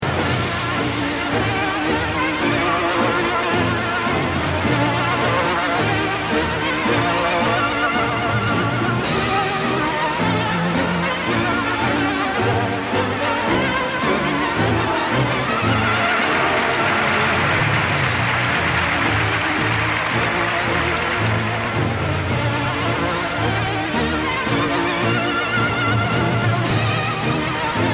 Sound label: Victory